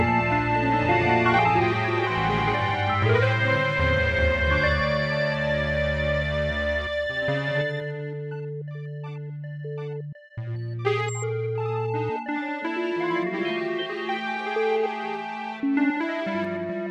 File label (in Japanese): oyasumi midi